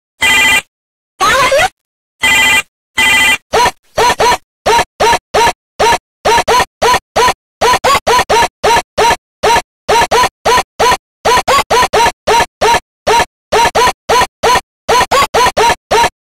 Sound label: Funk